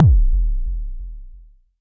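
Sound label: kick, Jomox MBase 01, drum machine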